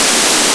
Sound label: tape hiss; E-MU Formula 4000 Series Vol. 3; Analog Odyssey; VK+ Instruments